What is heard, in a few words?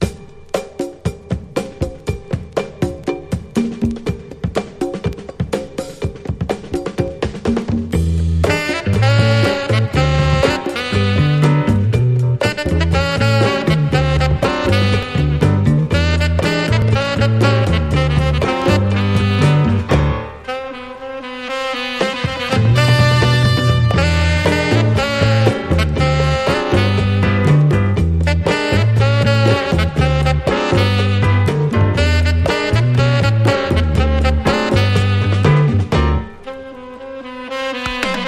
LATIN DRUM; JAPANESE JAZZ